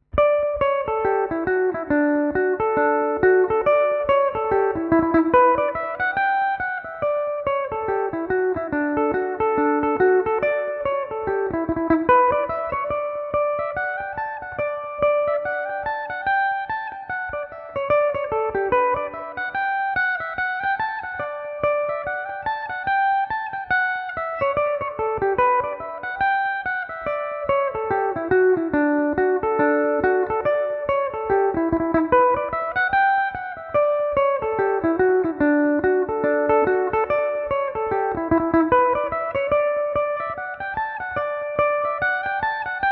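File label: hornpipe D major, Irish mandolin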